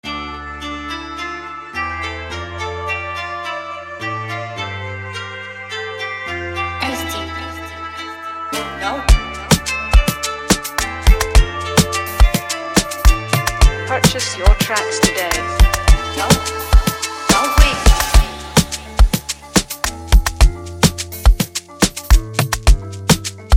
FREEBEAT, type beat; prod by sd beatz

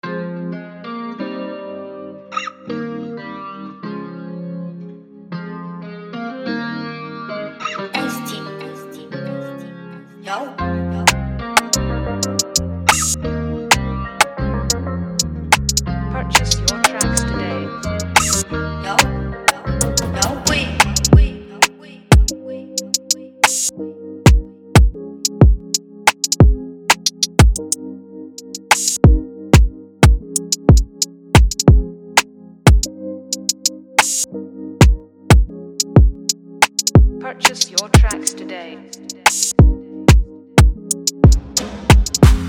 FREEBEAT

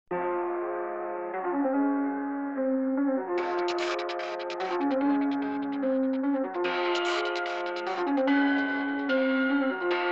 drill beat

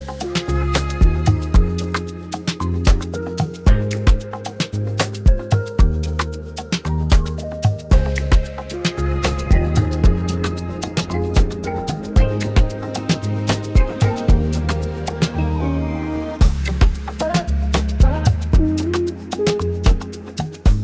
BEAT

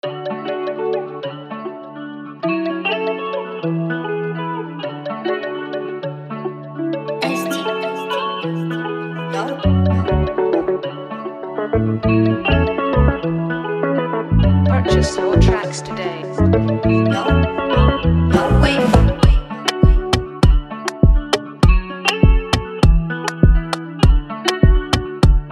FREEBEAT

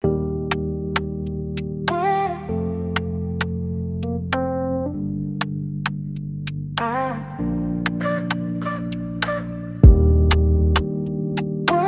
Palm Wine beat

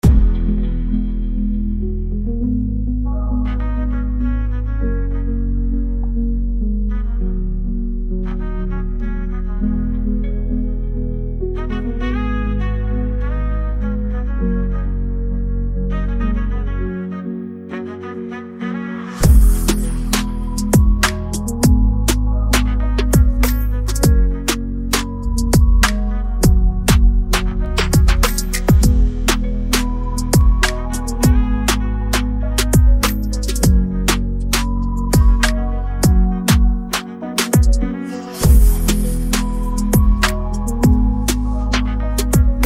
BEAT

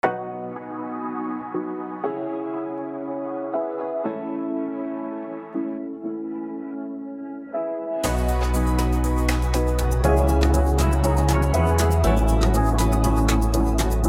BEAT

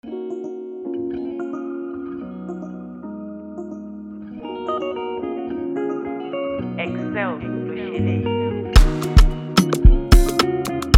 BEAT

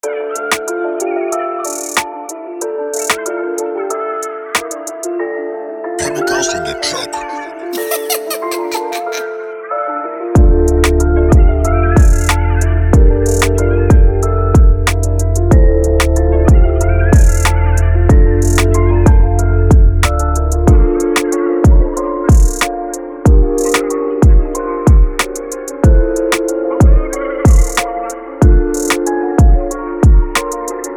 Instrumental Type Beat

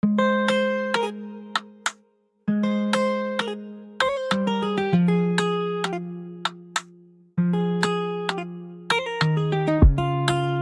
BEAT

